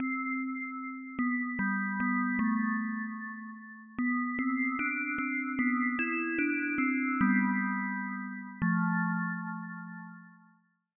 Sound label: lose music